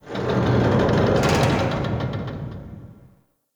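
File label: Metal Creak Distant One Off; Ambience; Warframe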